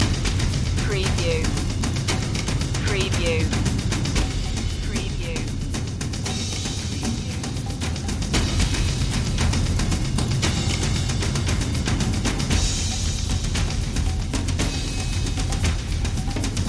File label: percussion loop